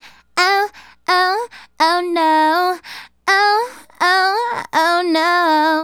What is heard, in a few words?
Female Solo, Hip-Hop and RnB Vocals